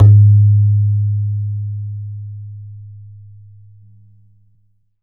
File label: BAYAN, Drums Percussion